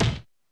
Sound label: Electro THUD; Central Processing Unit; Electro Samples Vol. 2